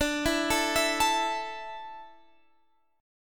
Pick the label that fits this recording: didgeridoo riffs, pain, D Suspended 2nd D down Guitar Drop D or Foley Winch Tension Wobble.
D Suspended 2nd D down Guitar Drop D